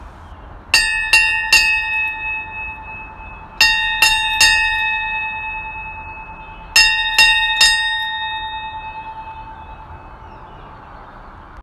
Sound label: Bell Sound